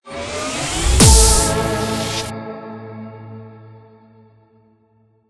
Transition; everspace 2

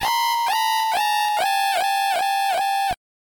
Defeat; Winners Horse

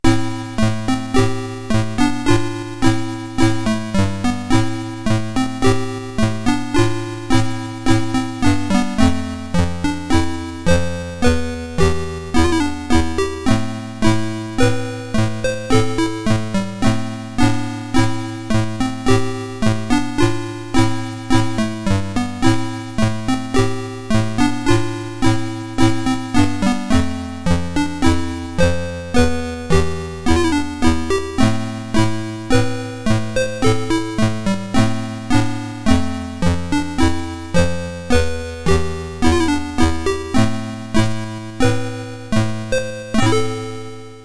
Game Over (AY); Light Corridor; ZX Spectrum

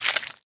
squish suction wet juicy; A Dump in the Dark

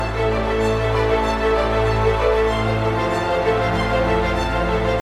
jingle next round; sh jingle nextround a v3 loope; Shattered Horizon

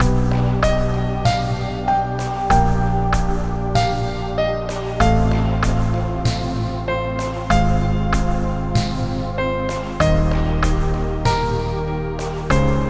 Bgm Main

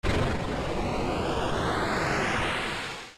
Muddy Water; pokemon reborn e19 2022

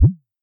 Boss Boing

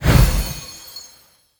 SFX Fight Health Up; mighty party 2017 gamerip pc ios android

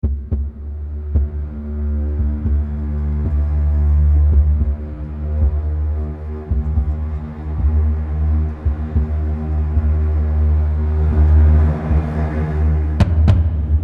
Combat; The Last of Us; Cannibal Combat 1 Combat Chunk

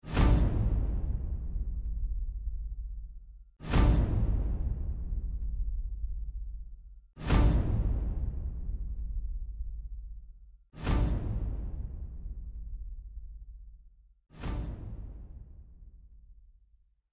Combat End; Rango; PS3

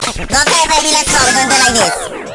Battle Mode Stage Start

